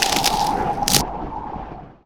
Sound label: ice wall slow; weapons; dota; invoker